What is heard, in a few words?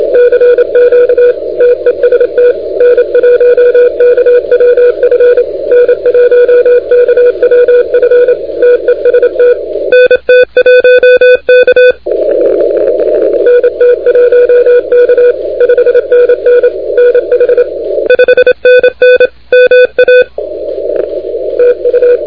CW 28MHz; ARRL10M